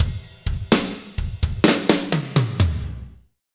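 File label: slow shuffle, Roland; Northstar; Drumscapes Roland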